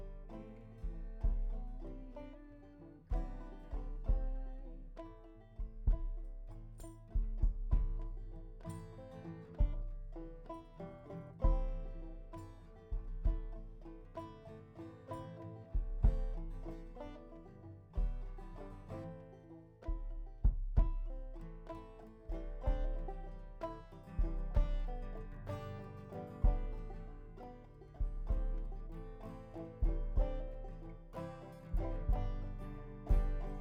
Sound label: Chordz